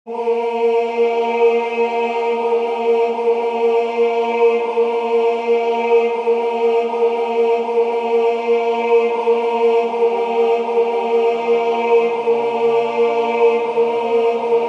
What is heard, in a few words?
tenor; Elgar Angelus